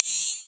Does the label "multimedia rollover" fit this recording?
Yes